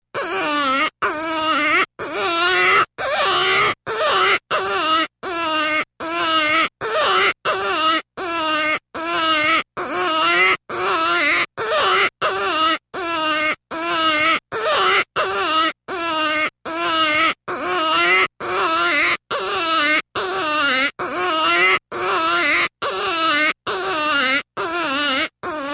Jackrabbit Distress

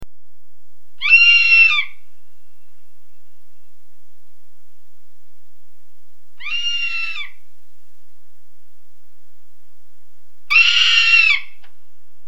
ferruginous, hawks eagles

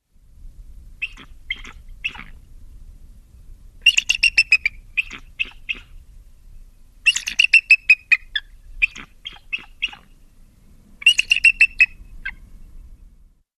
bald, hawks eagles

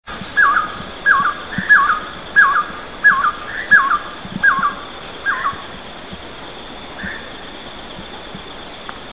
rufous-breasted wood quail; upland birds

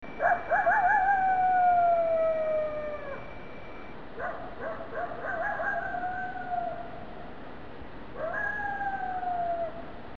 Coyote Howls

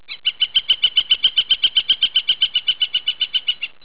flicker

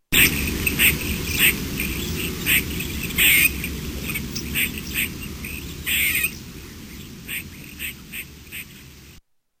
gray, squirrel

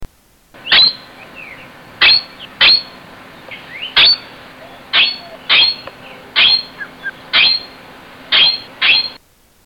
palawan peacock pheasant; upland birds

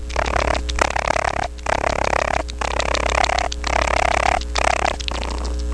cooing, raccoon

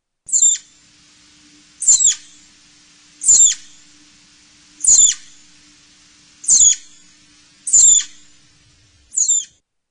flying squirrel chirping

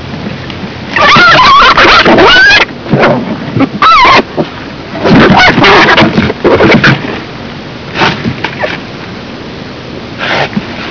groundhog fighting

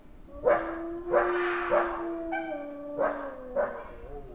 timber wolf howl

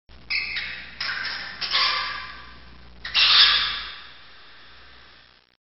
sewer, rats

